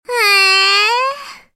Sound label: stabbed; freak fortress 2; flandre